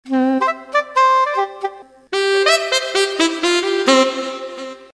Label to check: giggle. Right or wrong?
Wrong